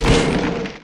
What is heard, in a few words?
injured